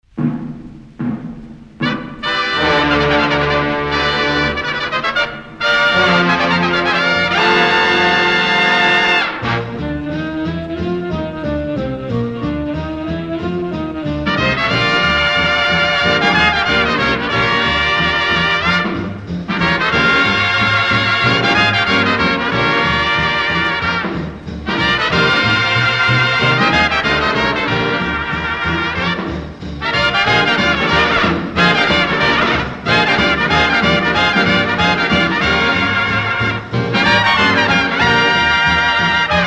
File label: trumpet blues